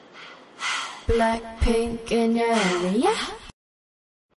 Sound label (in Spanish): notificacion